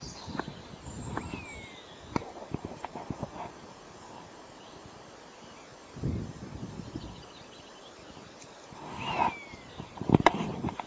flight; allen

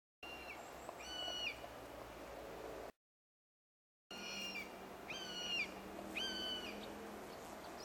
common buzzard juv hunger cry; swallowship

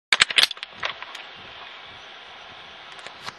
juv south tyne long flight call

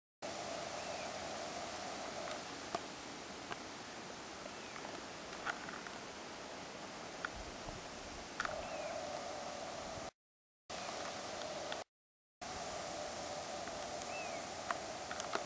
honey-buzzard juv anxiety call; swallowship

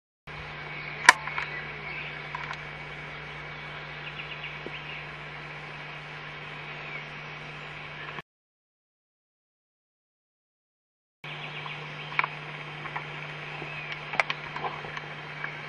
south tyne calls

